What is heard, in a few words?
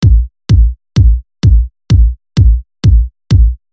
Drum Set 128 Kick